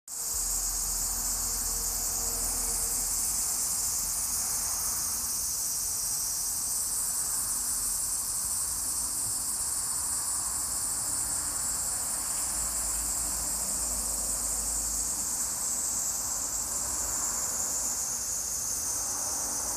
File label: cicada